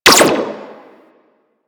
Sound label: Sentinel Laser Rifle Fire; DE; Warframe; Weapons